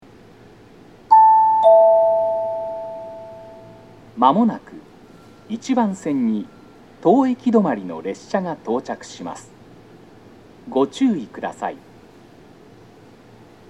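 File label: Toyohashi approach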